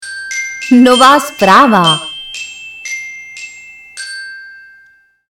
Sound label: chimes